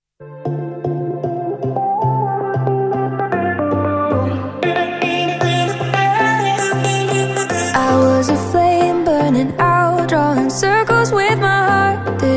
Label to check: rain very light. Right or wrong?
Wrong